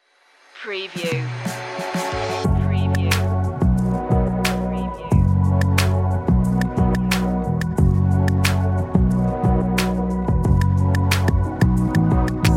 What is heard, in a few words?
delicate flower underscore, dreamlike